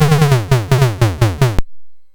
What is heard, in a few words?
Drums, MOS6581R4; SoundEffects